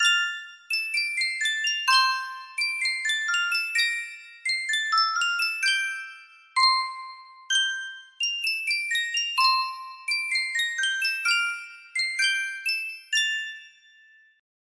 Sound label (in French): sankyo 12 note boite a musique